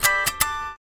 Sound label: Guitar Strum